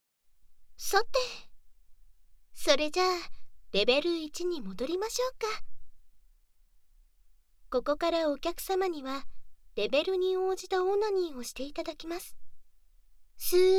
耳舐め, ASMR; Lv.1 耳舐めオナニー

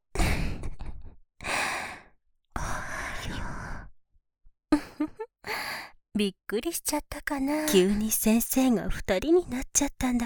ダブル 囁き 耳舐め オナニー; ドスケベ先生とはじめてえっち！

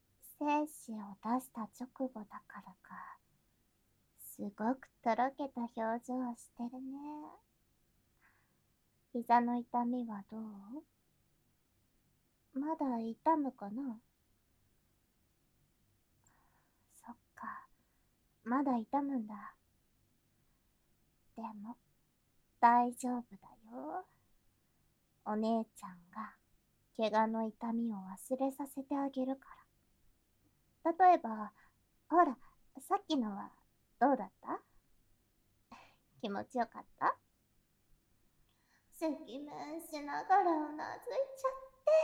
キス

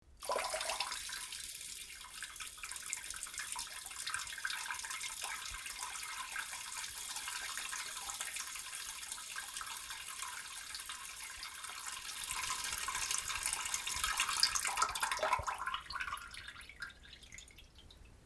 ぼばしゃしゃおしっこ

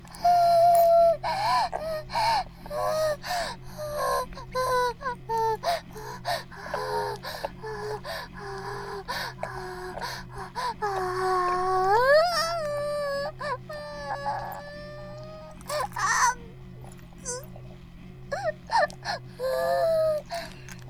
バイブ放置; イクたびにわけて

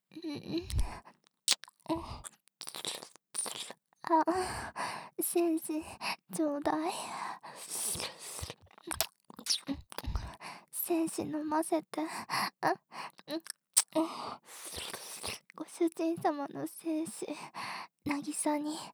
耳舐め 喘ぎ; 精子ちょうだい♪

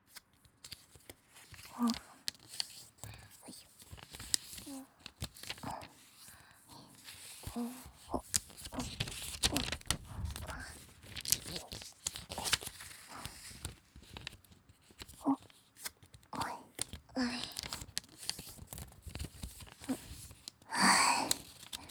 おりがみASMR

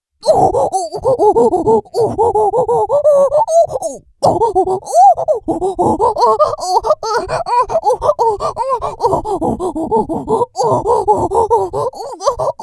おっ！♥おっ！♥おっ！♥おっ！♥

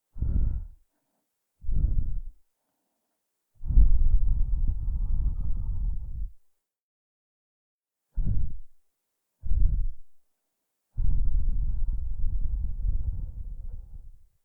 耳かきの後の吐息; クリスマスフリートーク

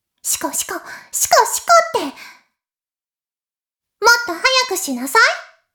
しこしこ音声, ASMR; おにいちゃんアイスぅー！

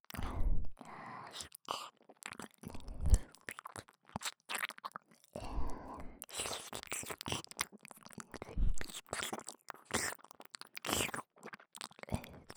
耳舐めループ

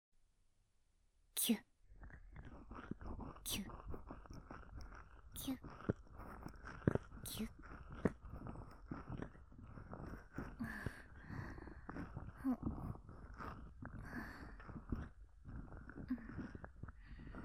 耳マッサージ, ASMR

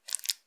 くちゅ音単発, ASMR

